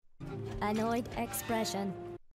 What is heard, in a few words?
annoyed expression